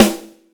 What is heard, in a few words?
full acoustic snare g sharp key; snare drum samples